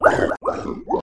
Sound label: mermen die; wesnoth